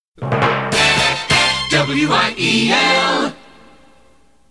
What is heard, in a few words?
jingle